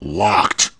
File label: blue door; Messiah; The Games Machine 76